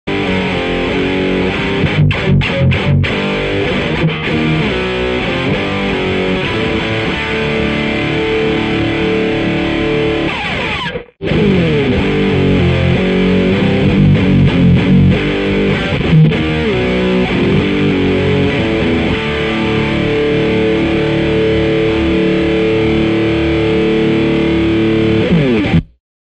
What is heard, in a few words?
distortion; Dr Boogey